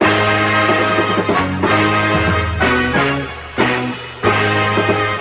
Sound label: horns, phonky loops